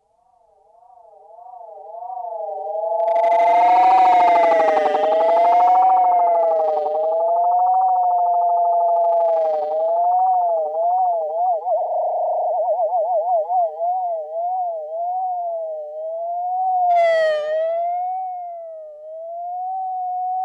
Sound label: Dreadbox Chromatic Module Stereo Space